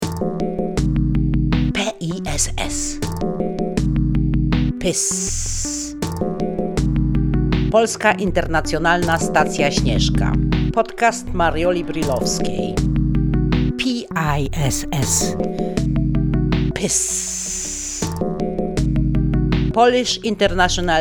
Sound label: JINGLE; Mariola Brillowska; POLAND INTERNATIONAL SPACE STATION